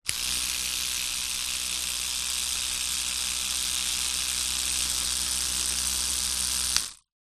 household electric toothbrush switch on run med short off